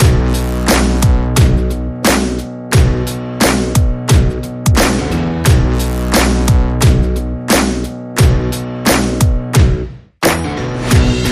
No Lead No Vocals

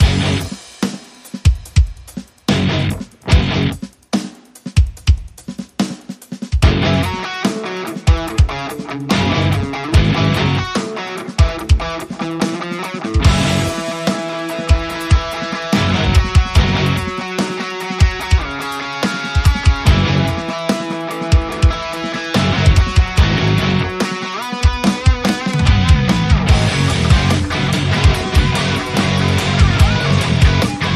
Funk With My Rock

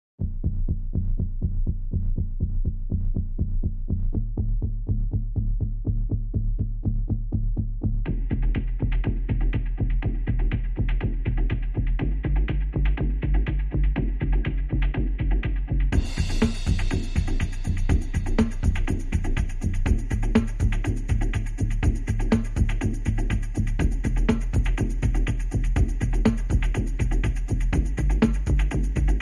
Curious Circumstances, Drums Bass and SFX Only

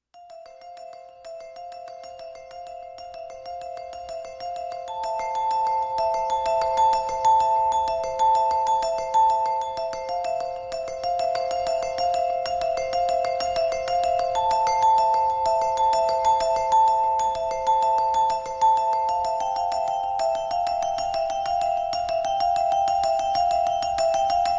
Vibraphone

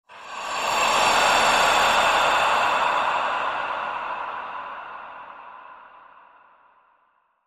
Transition Final Breath

